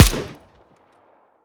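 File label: negev clean, gun; arccw go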